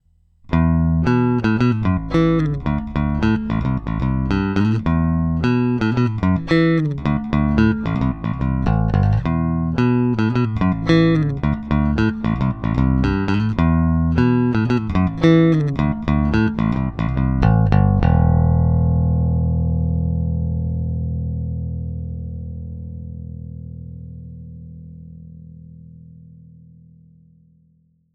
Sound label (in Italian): Entrambi plettro aperto; JBA54 VS 60s